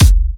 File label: Bassdrum; Vengeance Samples